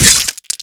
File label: icicle sticky flesh; frost; impactbreak